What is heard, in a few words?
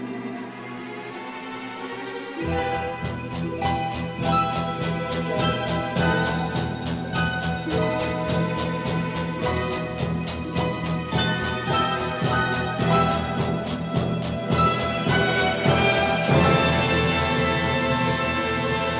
Harp